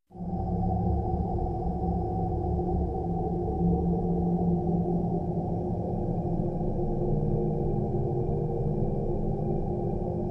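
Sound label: Space Amb Background, sci-fi ambience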